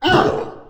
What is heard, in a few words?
grunts; finalmother